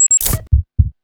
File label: small medkit; player